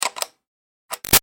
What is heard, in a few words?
1/4 Inch Plug In Out Electric Guitar; Switches; Objects